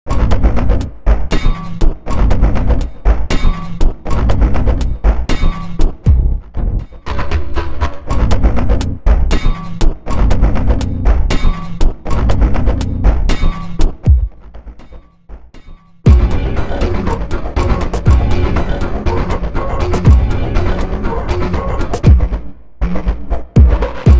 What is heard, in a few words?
klang beats